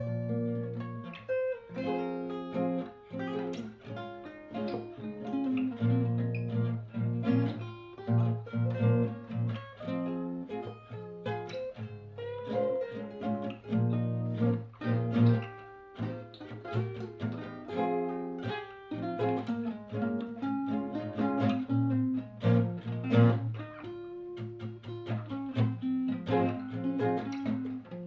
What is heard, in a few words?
Jam